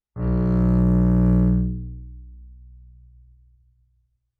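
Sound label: Bass arco fortissimo sul A B2, Double Bass; MIS Pitches - 2014; Strings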